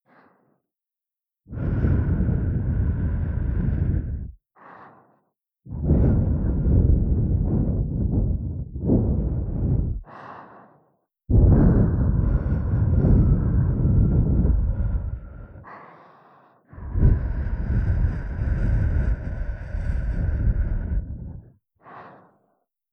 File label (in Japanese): 両耳ふーふー; オナサポおまけトラック